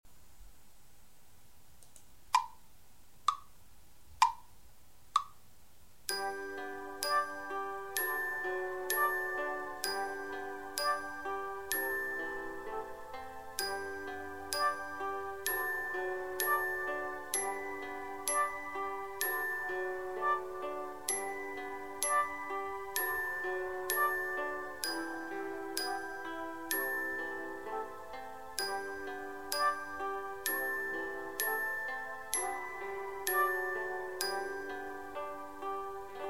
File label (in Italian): Canzone di tre note